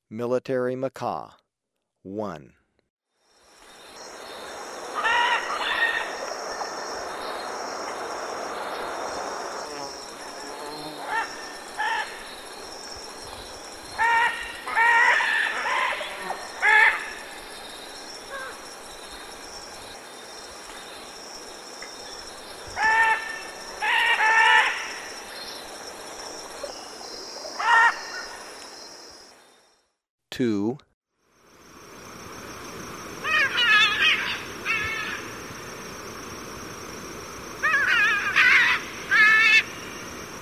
Military Macaw, Birds of Ecuador; S. America

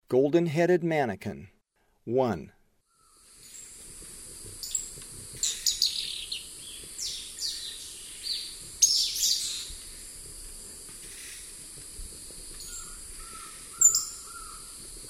Golden-headed Manakin; S. America; Eastern Lowlands

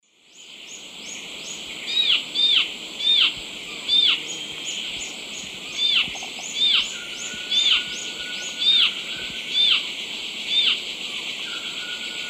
Microbates cinereiventris, call; S. America; Eastern Lowlands

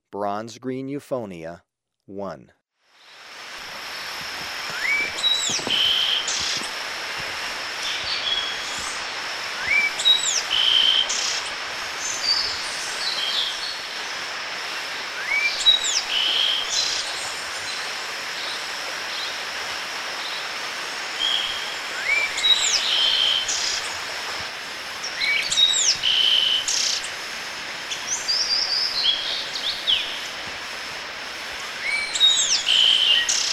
Bronze-Green Euphonia, Birds of Ecuador; S. America

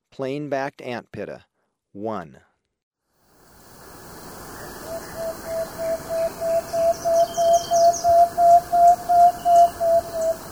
Plain-Backed Antpitta; S. America

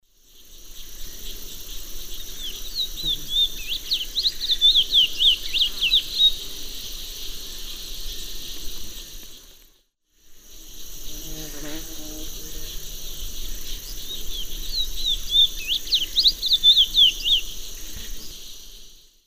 Oryzoborus angolensis, song; S. America; Eastern Lowlands

